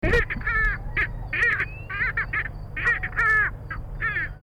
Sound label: eupodotis senegalensis, birds calls; otitidae